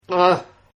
Groan; Groaning; Voice; Partners In Rhyme

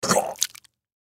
Human Vomit, Vomit Cement Element 20 Yrs; Male; Vomiting; Blastwave

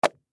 Newspaper Drop, Newspaper Drop Rolled Up Cement; Drops; Foley; Objects; Blastwave

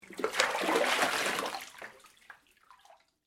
Water Movement Tub, Water, Movement, Large, Tub; Nature; BlastWave

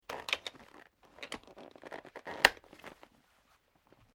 SKI BOOT TIGHTEN; Snow Skiing; Skiing and Snowboarding; Sports; Foundation4

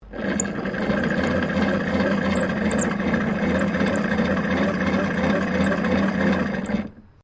Telephone Old Wallphone Crank Operating; Various old telephones; Electronics

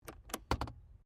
CAR MAZDA RX7 SERIES3 EXTERIOR GLOVE BOX OPEN; Car Mazda Rx7 1983 Series3; Cars (Sound Effects:Vehicles)

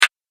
Analog Click

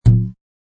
Balloon Hit; Bonk sound from tapping on balloon; Cartoons

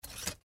Hanger Take Off, Hanger Take Off Bar; Household

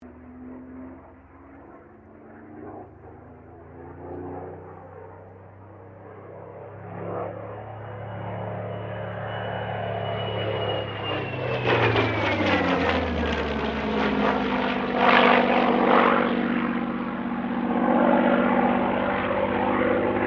AIRCRAFT SUPERMARINE SPITFIRE MK-XVI PASS

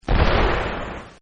BOMB, explosion; Explosions; Partners In Rhyme

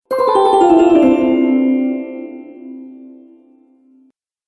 Harp And Chimes Gliss Descending; Musical Sound Effects

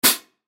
Cymbal Crash Mute, Cymbal Crash Muted; Musical; Cymbals; Blastwave

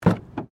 Door Open, car; Hyundai Accent 2008; Hyundai hire car; Vehicles

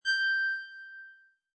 Musical, Button sound or menu sound; Multimedia

